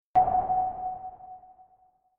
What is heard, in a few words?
sonar low